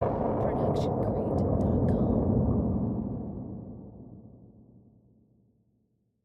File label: thundery explosion; soundscrate